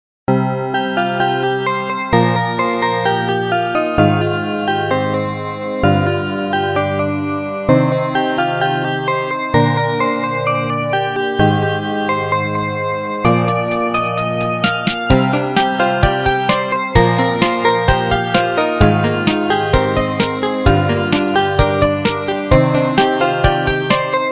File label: music